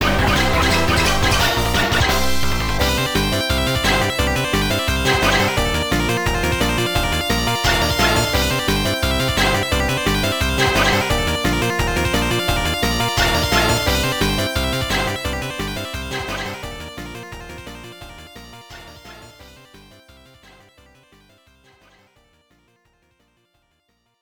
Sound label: 6-Chain Combo; bomberman panic bomber arcade